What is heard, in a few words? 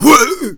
attack, Zombies; zps; Z Punk